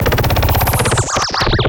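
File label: Production Element Sweeper; Sound Effects Bible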